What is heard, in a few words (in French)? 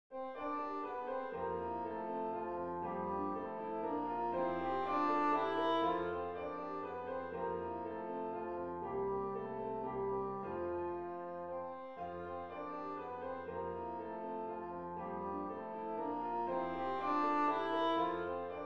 valse fa majeur; beethoven ludwig van